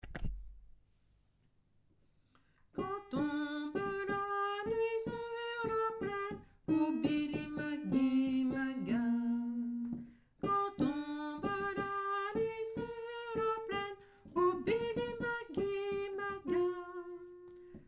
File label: alto